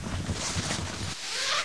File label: ammo pickup; items